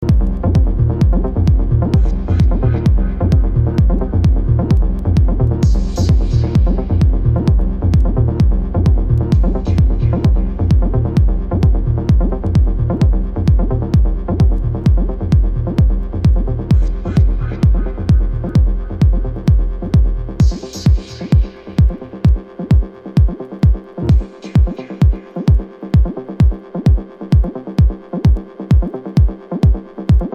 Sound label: acid dub